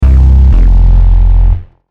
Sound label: obstacle